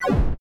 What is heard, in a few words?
Shoot Laser